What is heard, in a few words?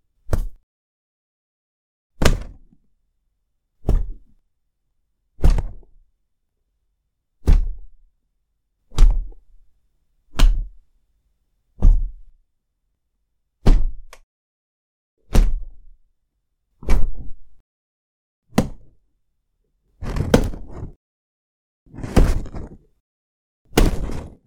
Various Thuds